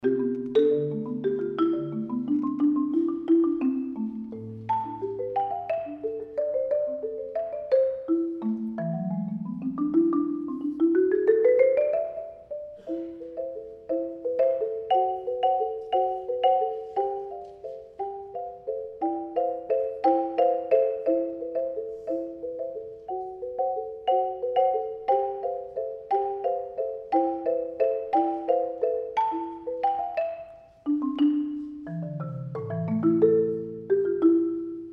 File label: marimba; carol of the bells